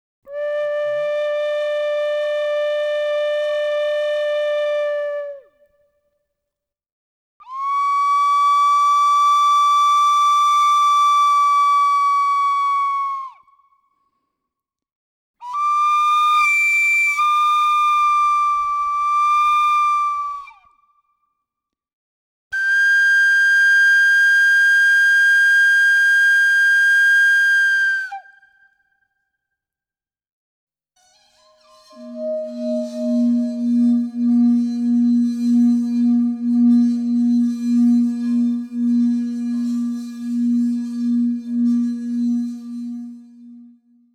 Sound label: Didgeridoo And Overtone; Good Stuff Audio